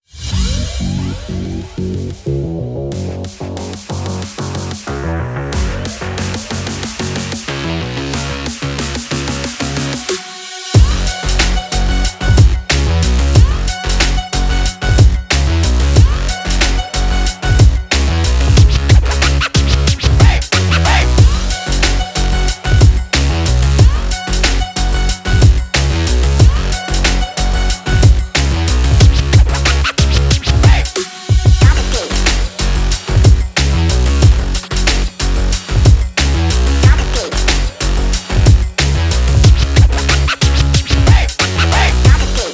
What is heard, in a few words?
Racing Energy Breakbeat; quick race linux macos ps4 switch windows gamerip 2021